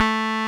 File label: Roxichord, E.Piano